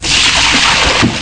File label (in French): vague; MOBICLIC 74